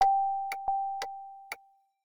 Car Alert, notifications; Samsung; Galaxy A56

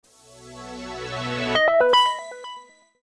Power On; LG; KF390

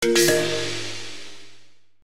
Chinese close; Nokia; audio themes

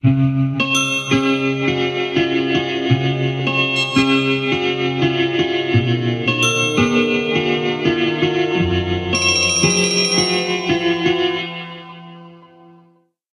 Forest air, alarms; Tecno Boom J8

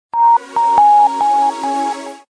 startup; Vivatel

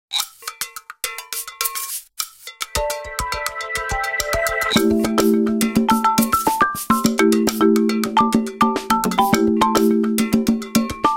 Africa Vibe, Nokia Tones; Windows Phone apps

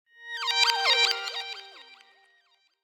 Pass, notifications; ZTE V811

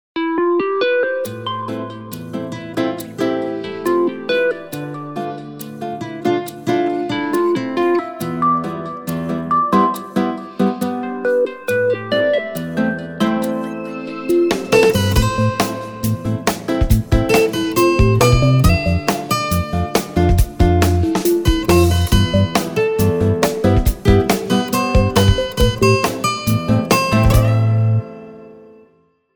ringtong; Gionee V185

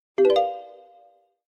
Rolling, notifications; ZTE Axon 20 5G

